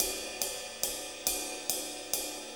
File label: 6-8 Ride, HiHat & Ride Loops; Sonic Foundry (Sony Creative Software) - Loops & Samples Drum Tools